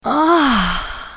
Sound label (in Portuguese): Ahhhhh, mulher gemendo